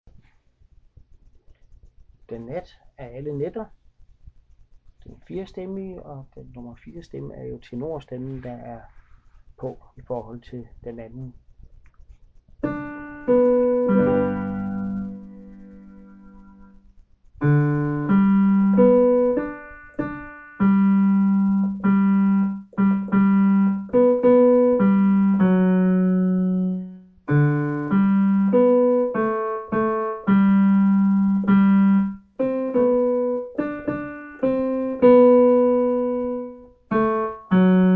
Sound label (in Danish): tenor satb; Den nat af alle